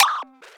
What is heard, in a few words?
fm dyn note c5